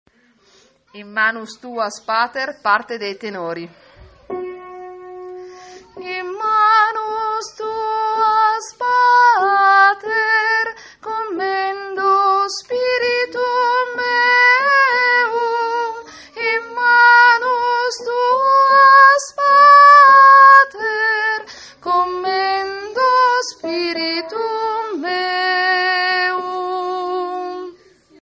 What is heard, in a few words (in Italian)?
tenori